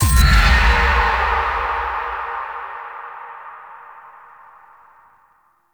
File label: Helmet Stung; Operator; Warframe; Sacrifice; Liset